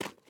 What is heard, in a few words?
ice step